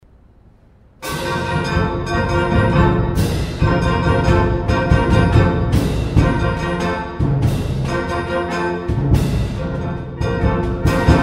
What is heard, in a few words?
Concert Band